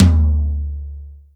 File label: modern high tome drum sample D key; Duration 0:01; Samplerate 44100 Hz; Bitdepth 24; Channels Mono